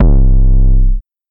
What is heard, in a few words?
808 tube